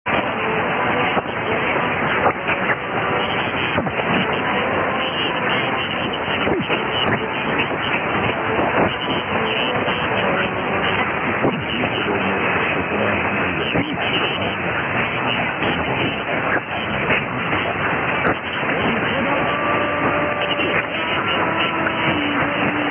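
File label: Iran end music then Koran, radio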